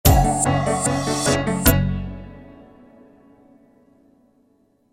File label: Minimal Sting